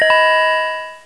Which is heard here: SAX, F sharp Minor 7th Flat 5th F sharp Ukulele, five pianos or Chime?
Chime